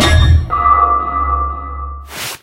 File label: vault in game animation max; talking tom gold run gamerip 2016